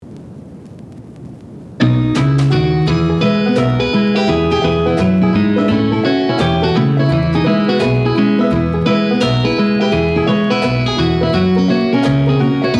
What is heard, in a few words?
Party Country Loop